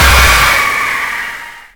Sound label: Jump Scare